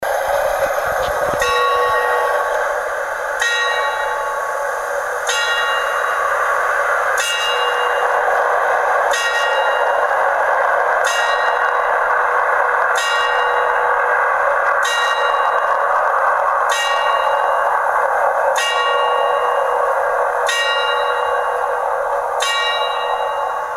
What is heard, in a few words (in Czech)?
cimbal; kos. Boziho tela, Skutec